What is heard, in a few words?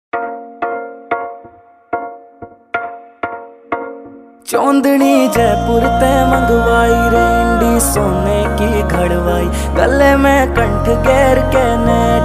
Acoustic Version, New Haryanvi Dj Song